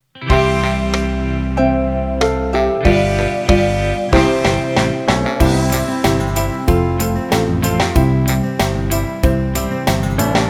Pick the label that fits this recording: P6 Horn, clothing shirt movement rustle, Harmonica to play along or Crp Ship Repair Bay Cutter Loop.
Harmonica to play along